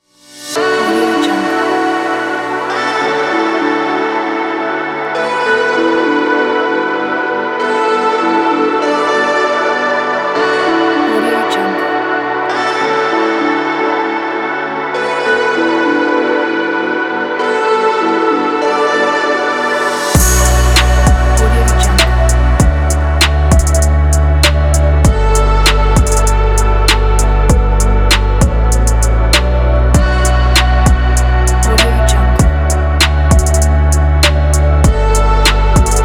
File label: urban beat